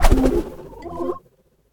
Fire; Smite; god Izanami

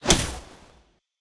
swing; Smite; god Khepri

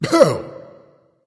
ouch, voice; smite; voicepack; ymir

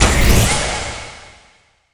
activate; smite; chronos

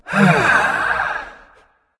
nv sigh; smite; voicepack; mercury

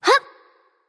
grunt jump; discordia

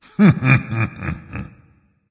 heh heh, voice; smite; voicepack; ymir

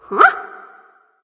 nv confused; cupid

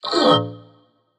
grunt hit defeat; babayaga; smite

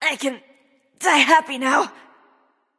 death; smite; serqet

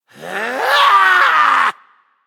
battlecry; smite; voicepack; jingwei

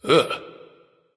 "ugh"; smite; voicepack; ullr

